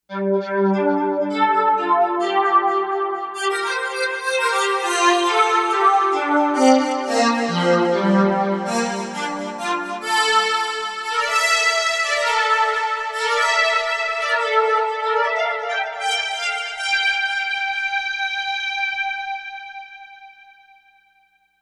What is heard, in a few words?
Jupiter Bow, Novation UltraNova; Factory Patches